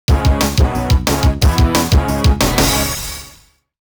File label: wolf logo stinger